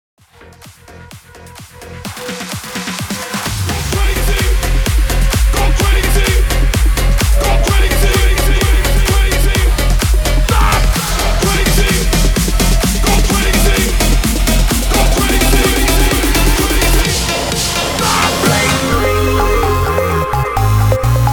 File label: BOUNCE HYPE 128 BPM